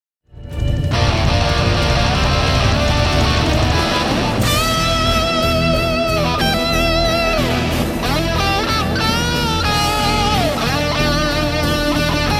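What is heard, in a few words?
guitar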